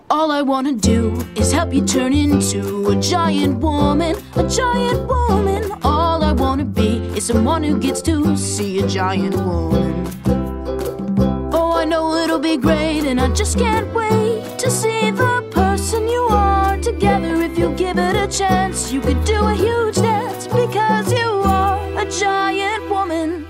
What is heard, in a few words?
8-bit